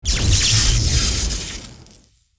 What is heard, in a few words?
bomb